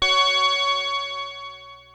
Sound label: PPG F#4, Synthesizer; Hollywood Edge; Giorgio Moroder Rare Synthesizer Collection; PPG.2.3